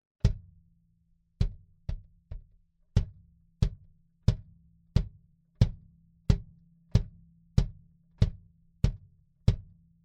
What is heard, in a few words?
Sub Kick Beta 52